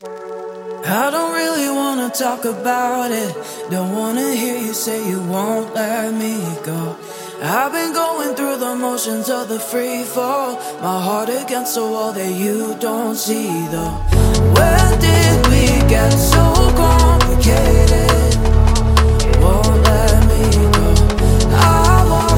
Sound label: Construction Kit Full Mix; sickrate siik essentials iv